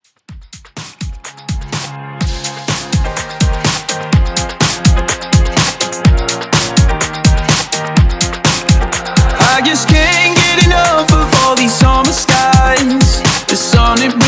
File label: Club Top40 Dance Up 125 BPM